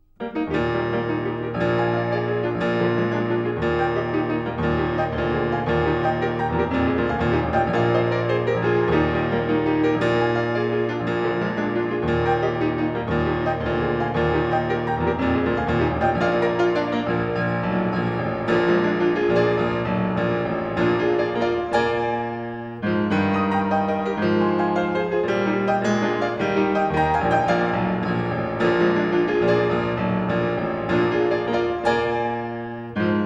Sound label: Variations and fugue on a theme for piano in E flat major; Beethoven